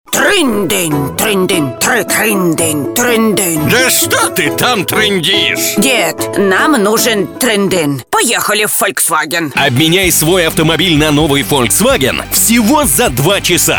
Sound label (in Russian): folk